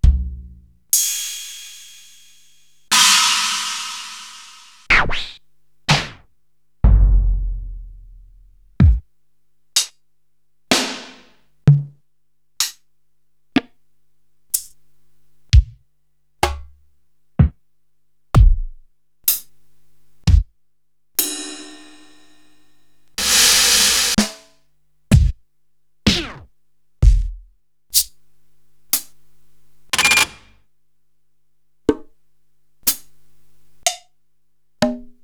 Korg TR-Rack, Samples Sliced; m8

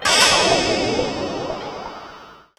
Ring Mod & Delay, contact mic Cymbal

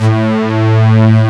OBX STRING A2; E-MU Formula 4000 Series Vol. 3; Analog Odyssey

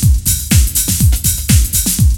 BREAK, BREAKBEATS; Crypton - DrumBase5000

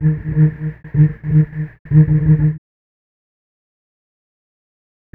Bass Cello; Sonic Foundry; Sony Creative Software; Stylus Pressure Urban Grooves on Digital Wax; What Up

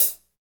HH IH, HH Real

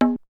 Perc; ZERO G - Datafile DISC 1

